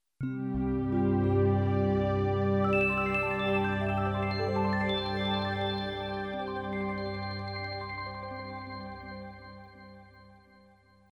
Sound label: bells jingle